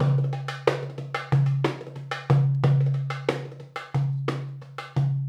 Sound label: PERC LP, Arabian Traditions